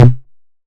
Bounce

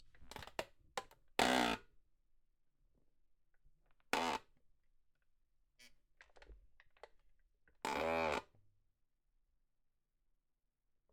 Plastic On Plastic Squeak Old Office Chair

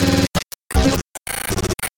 Glitches

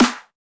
Clap Snare

